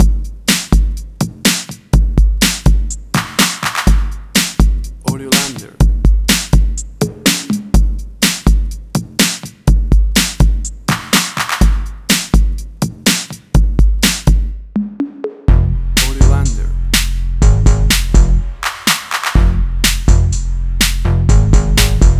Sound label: 80s Hip Hop; Willis Avenue